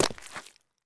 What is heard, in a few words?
mud, Players